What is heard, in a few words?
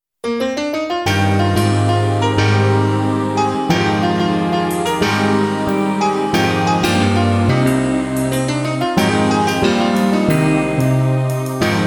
brass minus